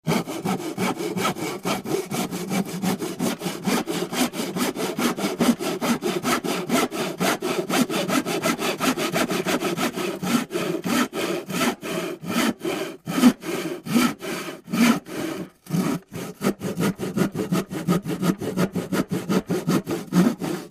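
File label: Hand Saw Steady Cutting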